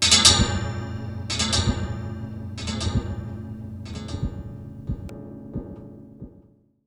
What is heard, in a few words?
Delayed, Wire & Tin, contact mic